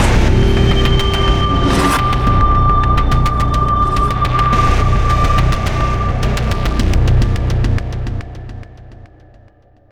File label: Mission Music Tense Stinger; starcraft 2 wings of liberty